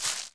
step earth

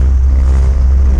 wings

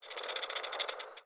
ambient; mahlendur

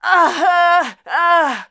DIE

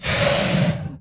ATTACK; mahlendur

